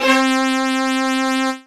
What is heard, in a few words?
Laff Horns; Ableton; Factory Packs; Retro Synths; P-50 Linear; P-50 Structures; P-50 Partials